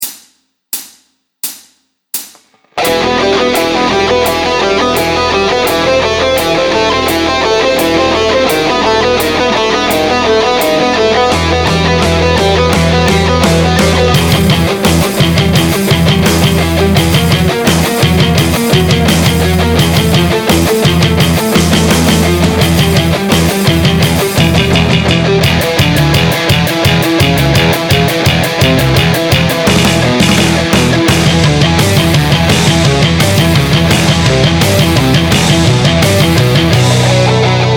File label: Neoclassical Metal Guitar Licks